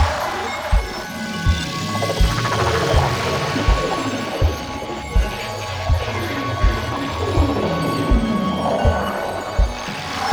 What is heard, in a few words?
Mind Control Loop; Orbital Station; Sensei; Warframe; Tau